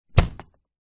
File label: flight case close; foley